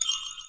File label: MOVE; C64; Commodore; C64 Dreams; Multi-Sound Default